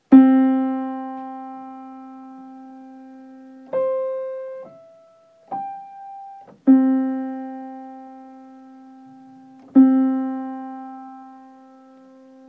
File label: Blooming Unison Melody, piano